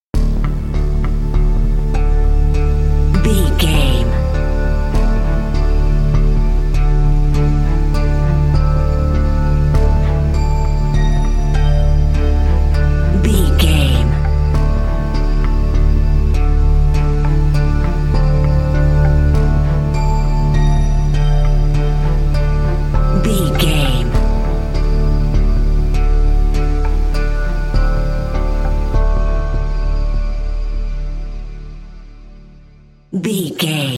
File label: building worry and tension